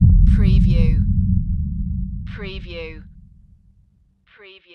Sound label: Underground or distant explosion; Action, Impacts & Explosions